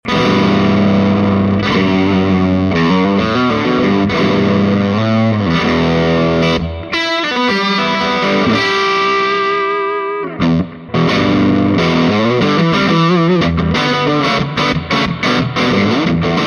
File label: SG Grind; Gibson SG-Grind 2; super 30